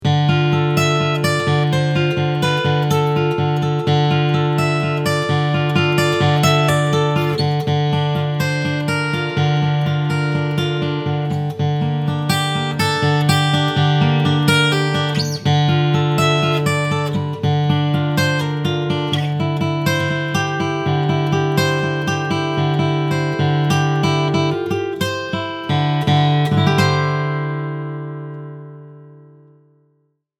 Takamine GD93 Picking High

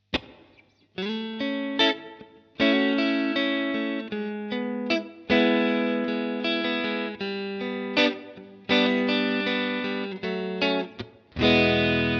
Clean Reverb Neck PU

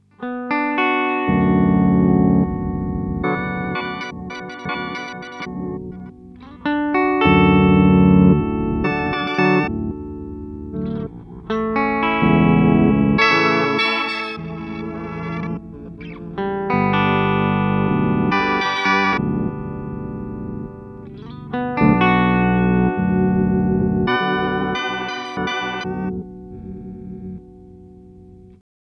Chase Bliss Blooper

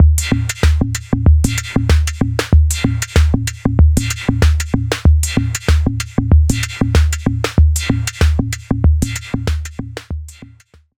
Backstage Gong; D16 Group Audio Software Nithonat 2

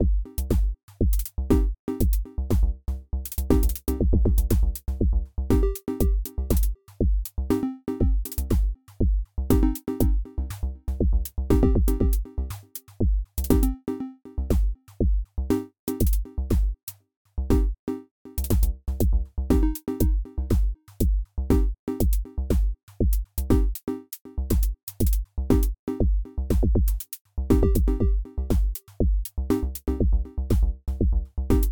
sum FX Original Drums

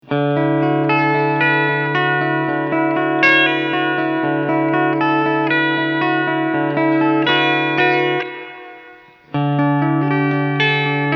Clean Picking Reverb

